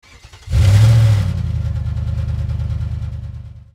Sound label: engine